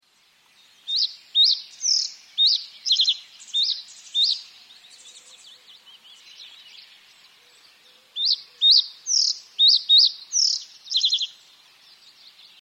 rietgors

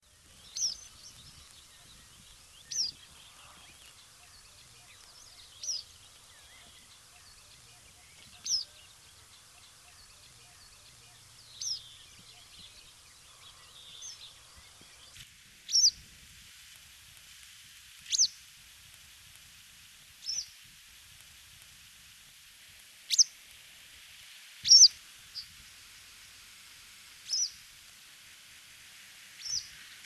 gele kwikstaart

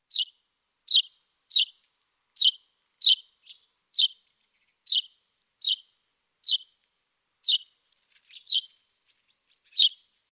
huismus

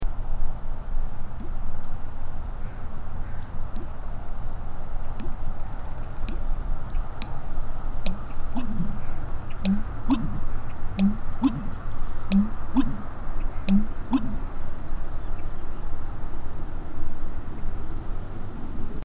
roerdomp

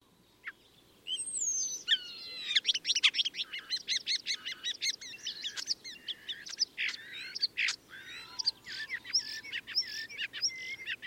spreeuw

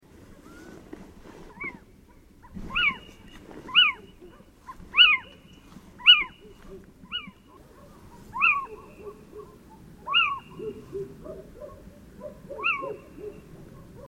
steenuil

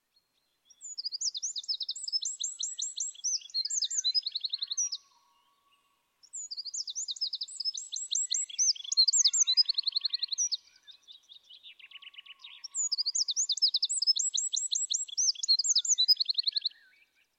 winterkoninkje

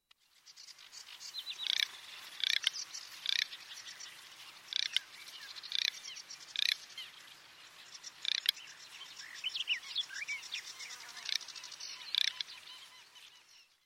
zomertaling

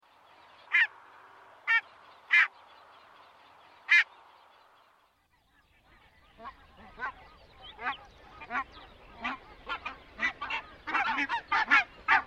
brandgans

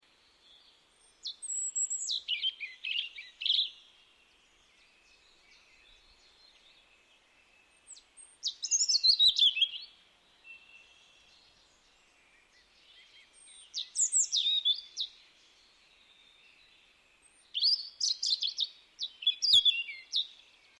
roodborstje

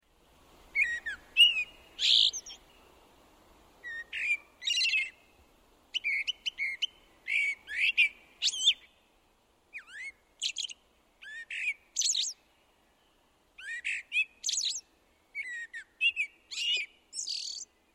merel

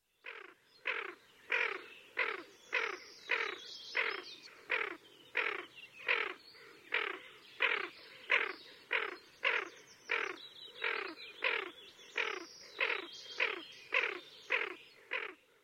kuifeend

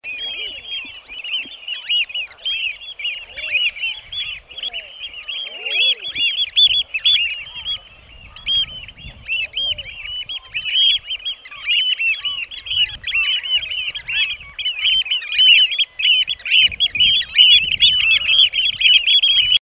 scholekster

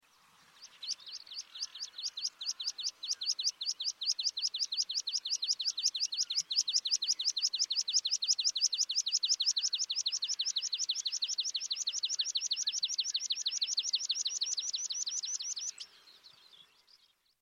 graspieper

